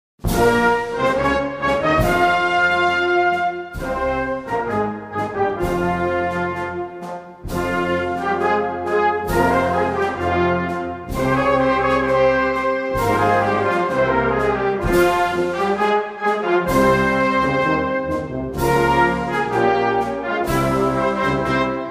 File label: anthem morocco